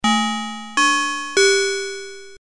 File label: bell